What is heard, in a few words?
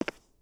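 Foot Concrete Sneaker Walk, footsteps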